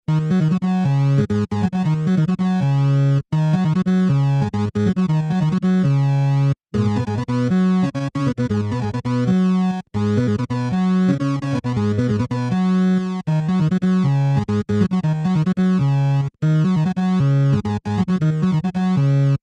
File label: Game Ultrafast Hippie Beatnix Alternating Theme; 8 bit - Gaming - Schnell